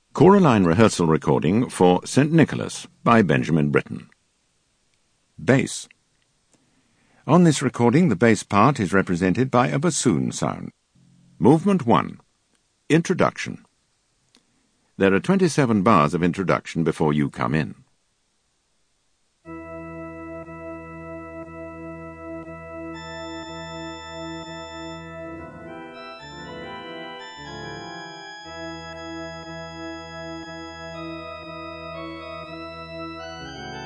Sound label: Bass; Britten St Nicholas